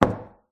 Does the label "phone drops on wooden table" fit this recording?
Yes